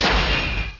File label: Anchor Shot; pokerogue; battle anims